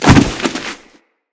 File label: Bodyfall; Kubrow; Bodyfalls